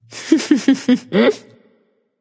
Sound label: heh heh; smite; artemis